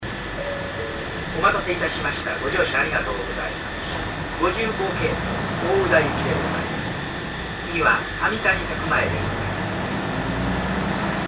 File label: bus tape orgel; kansai; narakotsu